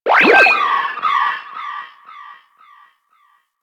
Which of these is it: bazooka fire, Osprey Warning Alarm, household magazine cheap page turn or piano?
Osprey Warning Alarm